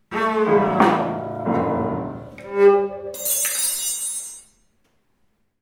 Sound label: improv jingle